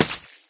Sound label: splat hit, paintgun; There; ThereClient